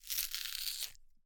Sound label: gore, small metal blade flesh movement with rip and gouge wet; Timothy McHugh; Gorification [HD]